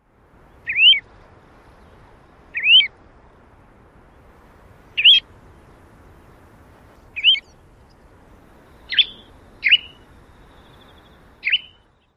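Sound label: calls, Pacific Golden-Plover; AK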